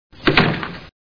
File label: door shut, household sounds